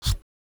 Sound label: Sword Swing